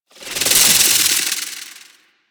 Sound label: Slinky Movement Rattle; Warframe; Man In The Wall